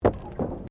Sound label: Tram Horse Drawn Step Wooden Bridge